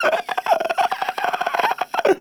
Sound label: Infested Runner Patrol; Warframe; Runners